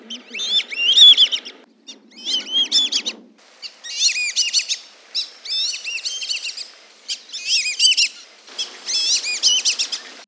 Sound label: Dendrocygna autumnalis males; The sex of a red-billed whistling duck is easily distinguishable by calls. Calls of six different males